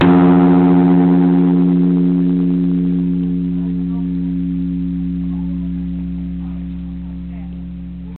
Enryakuji Bell

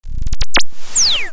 Space Fire Wave

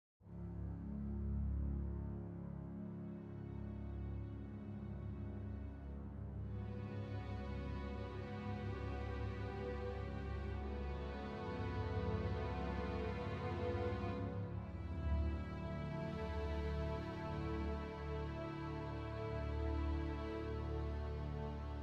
Horror Film Score Soundscape Creep

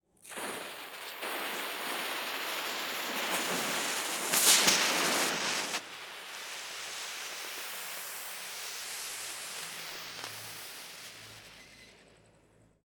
Smoke Bomb Small

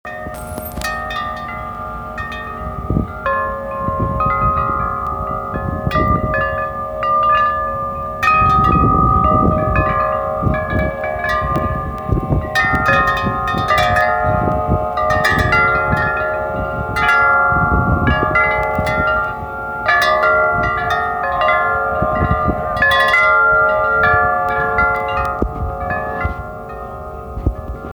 Windchimes

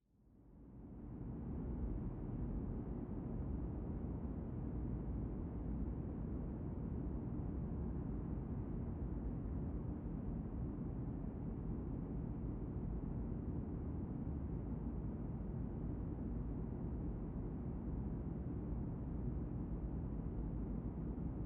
Interior Low Roomtone

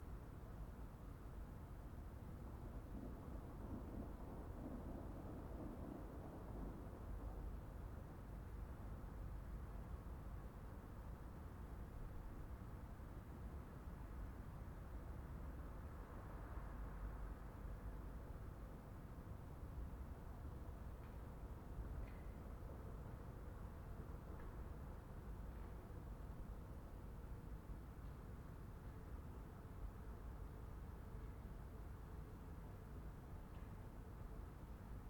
Room Small Night

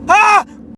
Aahh

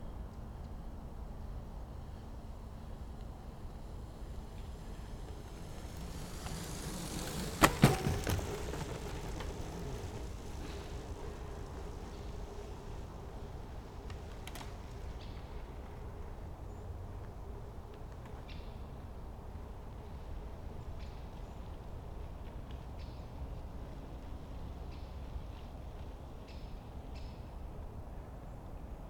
Mountainbike Pass In Wood

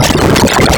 Prophet Vs Waves; Formatted For Morphagene

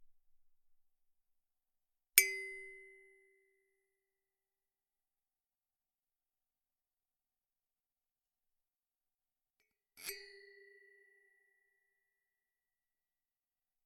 Metallic Ping Cpu Heatsink

